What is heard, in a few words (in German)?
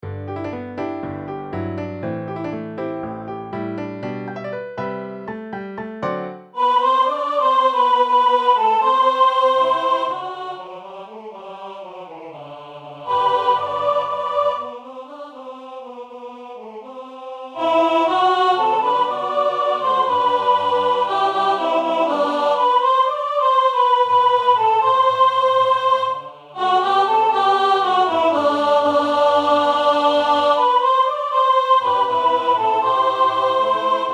Sopran